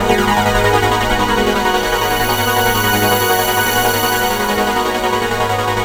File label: Pad, Jungle; Pads; Blu Mar Ten; Jungle - 1989 to 1999